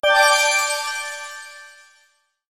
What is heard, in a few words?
Rank Up! Fanfare; Snorlax Rank Up!; pok-mon sleep mobile gamerip 2023